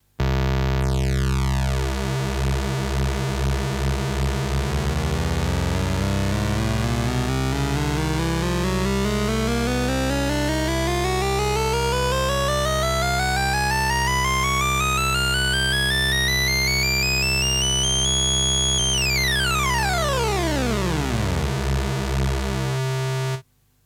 DCO Tunebereich

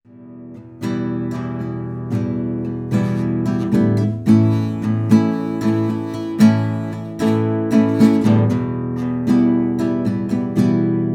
RODE NT1 Gitarre

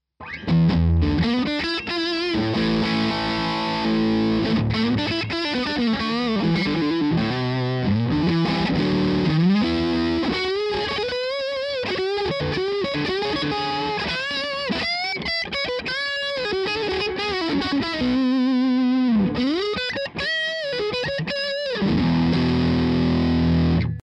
Hals PU Gain

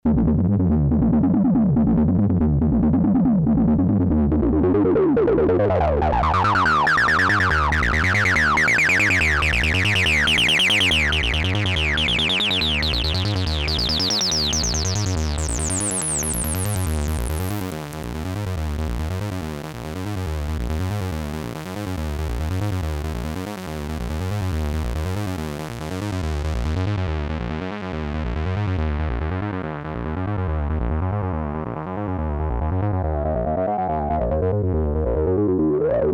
Keine 303

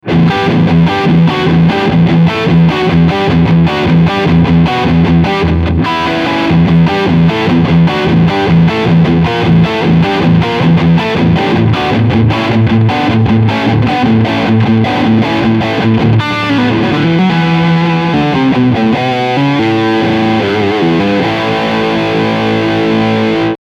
Riff Overdrive Steg HB

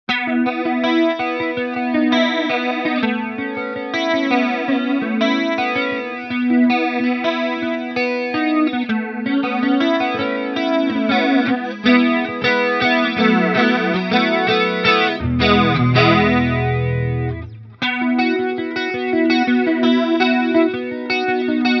PRS Wind through the trees Flanger nur LFO 1

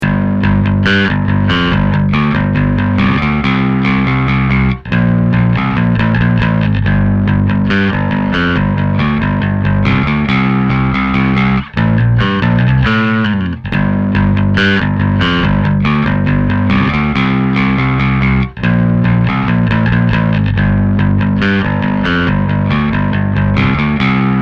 plektrum

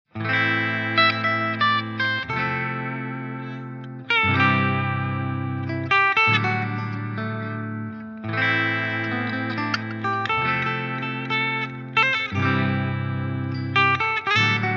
Steg SC clean; Harley Benton MR Modern CAR

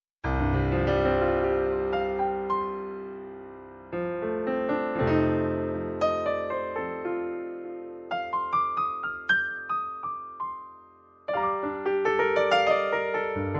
Nord Stage 2 Klavier

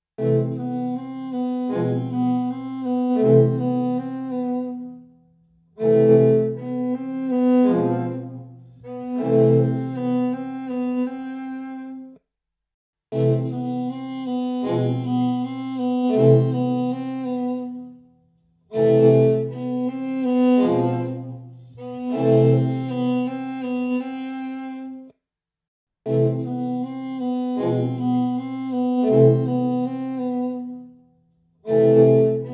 Streicher

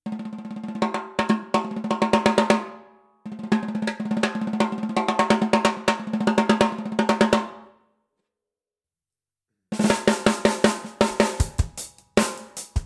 Protean hoch offen

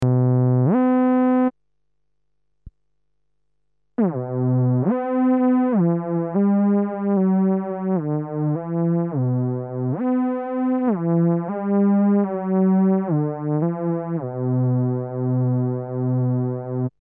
Behringer Model D mit Boss CE 5 Chorus Ensemble

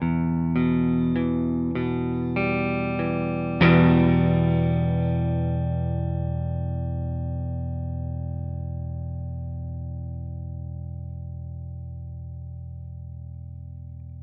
Maybach Lester Junior Double Cut Neck Sustain und Resonanz

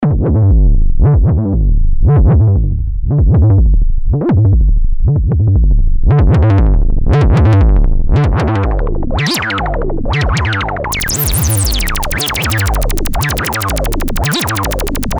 Monark 32tel Filter

